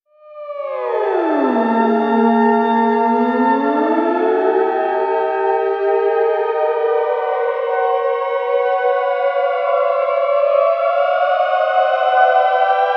Continuously sustained tones wander among each other sedately, Miniature Theremin; ringtones